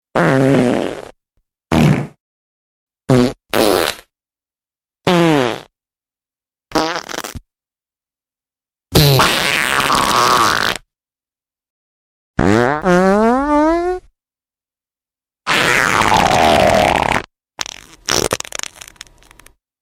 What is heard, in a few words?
Fart Tone